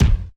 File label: kick nasty